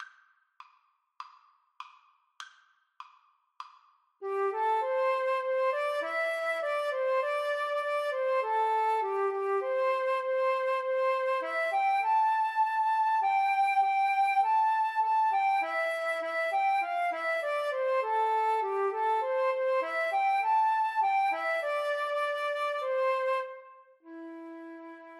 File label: loch lomond, flute duet